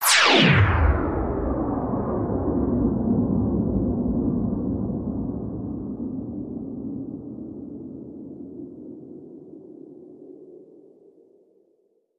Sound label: Extended Space Laser Zap